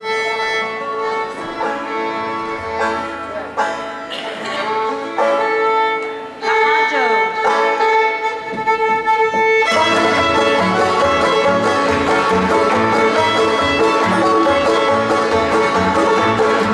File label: Cotton Eyed Joe, musicbox